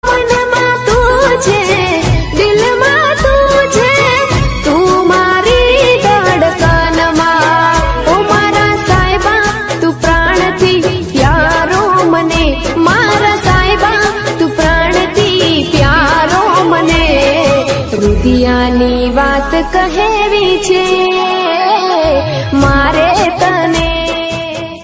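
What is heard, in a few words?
gujarati ringtone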